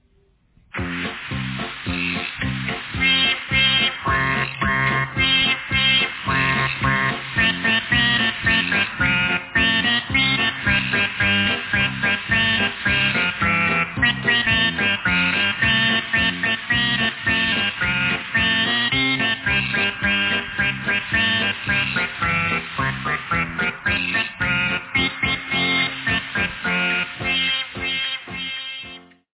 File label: Kalagh Instrumental